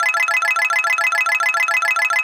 multy loop; netent

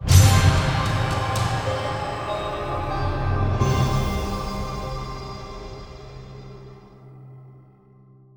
intro free spin win; netent

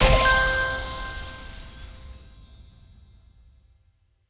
medium win; spinsane; netent

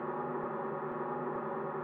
Reel spin

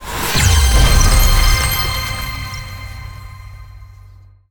big Win End; dracula; netent

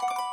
count up loop; netent

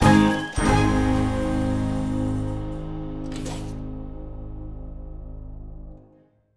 big Win End; netent

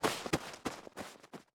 Snow Footstep Set; bonus Game Overview

